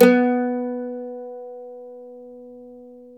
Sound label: MANDOLIN